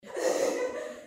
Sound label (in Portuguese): risada